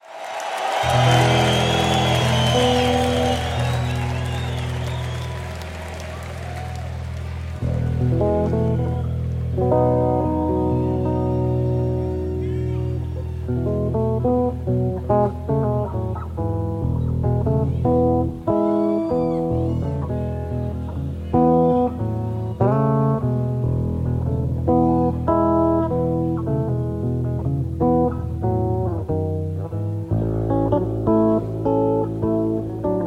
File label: Bass Solo